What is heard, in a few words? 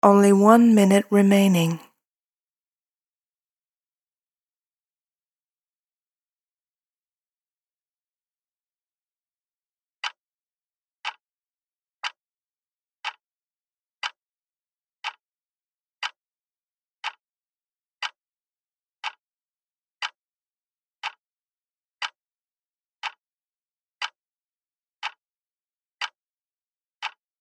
NYE Countdown Auld Lang SYNTH; CFLO; NYE Countdowns